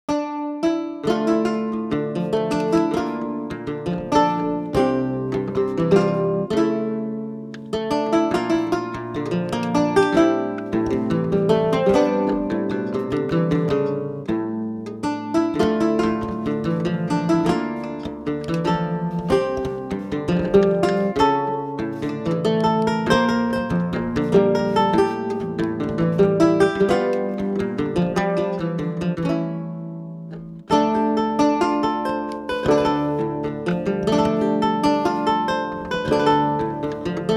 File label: Lute Ver.; the legend of heroes sora no kiseki fc evolution